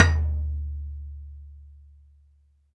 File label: DM5 Perc, Alesis DM5 drum machine